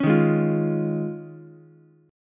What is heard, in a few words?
D sharp 9th Suspended 4th, Ukulele; strummed; 6,3,4,8; JGuitar; D#9sus4 Chord